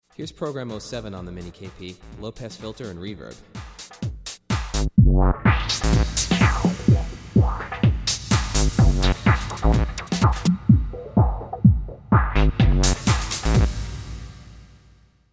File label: LPF Reverb